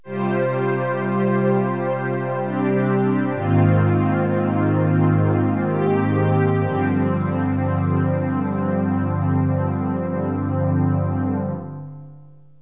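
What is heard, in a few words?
synth strings; gm-sound; Roland; An Invitation To The Roland World Of Music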